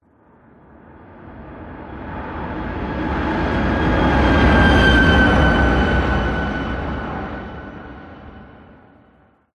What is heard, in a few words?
Passby Designed Whoosh Futuristic Scifi Overdrive Distorted Saturated; PMSFX